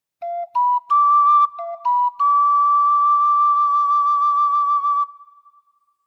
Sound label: Ocarina